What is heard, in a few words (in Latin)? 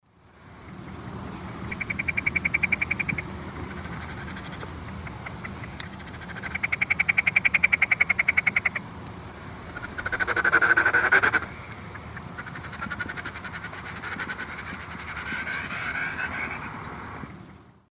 Gallinago paraguaiae, ground calls and winnow; S. America; Eastern Lowlands